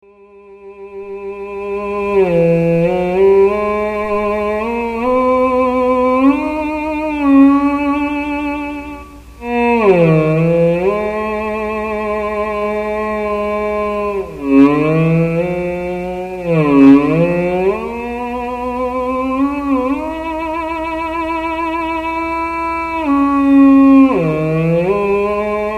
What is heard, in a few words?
theremin, resonator